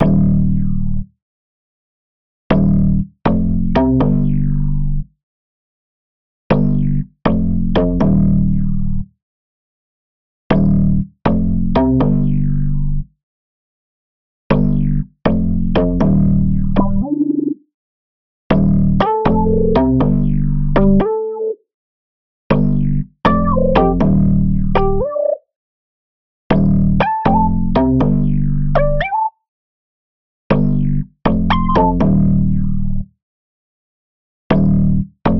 120 BPM G Min Bass; Cymatics; Blue Eyes

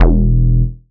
Bass E, techno synth shot; Bass One-Shots; Ghosthack; Techno Synth Shots 2025

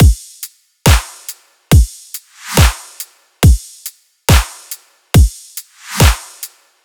Riddim Drum Loop Full (140); Drum Loops; Ghosthack; Riddim Essentials 2020

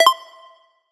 Collectibles Classic FX

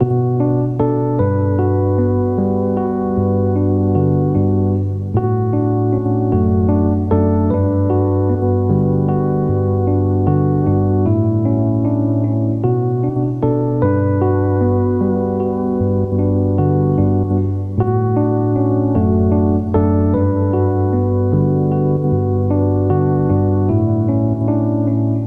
Keys Bm 76, Lo-Fi; Melody Kits; Loops; Ghosthack; Gone

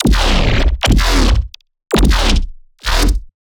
Dirty Bass, Bass Hits; One-Shots; GlitchWorld; Ghosthack; Color Bass Essentials

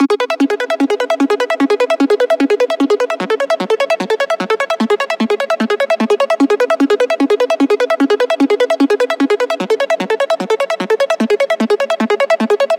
Dubstep Arp Loop, 150 BPM C# Min; Synths; Loops; Cymatics; EDM Starter Pack

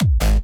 G# (Reverse Bass); Drums & Percs

